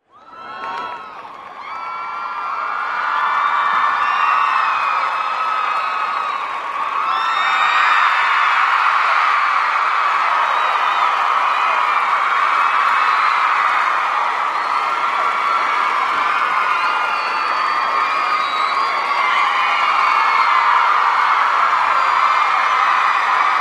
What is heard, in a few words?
Huge Extended Crowd Ovation, Ebbs And Swells Very Excited Concert Crowd With Women Screaming